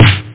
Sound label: high snare drum